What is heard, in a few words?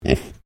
Animal Pig Tamworth Grunt; Hamish; Edgar's Mission Farm Victoria; Pigs; Animals